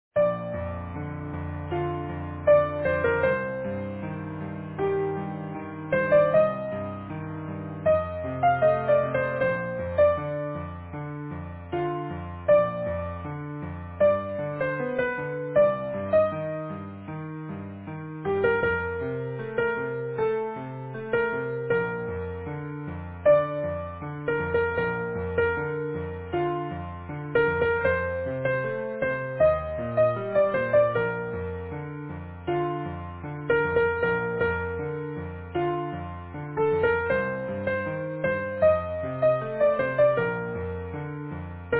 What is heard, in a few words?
easy piano